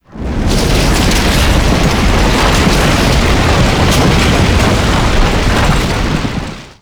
Avalanche Power; Warframe; Frost

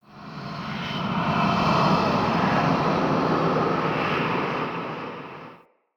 Eerie Texture, Ambience; RedNight

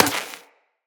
Laser Impact Metal

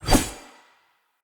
Long Sword Whoosh; Weapons; Player; Pothia

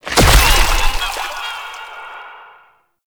Ink Wall Bounce; Gameplay; Warframe; Shadowgrapher

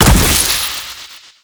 Treasure Box Proj Impact; Warframe; ManInTheWall

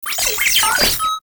Sniper Drone Vocal; Lasrian Drones

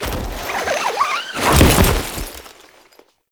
Dropship Zipline Land; Gameplay; Awakening Remaster

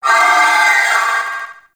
Attack Long, Vocals; Warframe; Sentients; Sentient Trooper

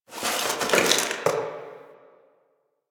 Box Of Trash Rustle

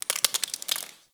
Ice Thaw Drip; Warframe

